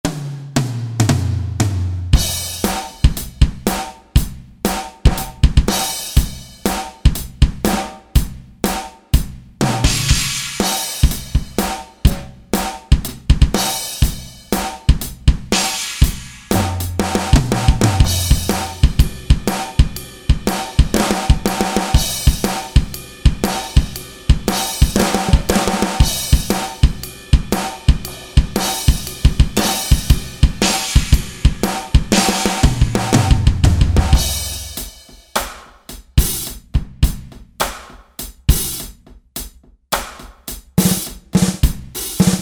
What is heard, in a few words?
Ring Kit, Roland SRX-07; Roland SRX-07 Ultimate Keys